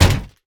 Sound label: bang